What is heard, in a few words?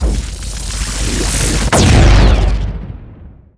over charge; weapons; cow mangler; tf2